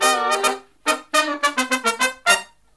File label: Brass Riffs; USB Soundscan vol.29; AKAI